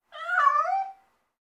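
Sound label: cat; son isole; chat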